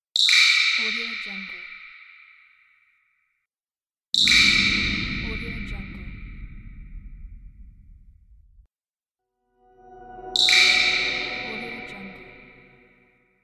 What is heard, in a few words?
cinematic falling water drop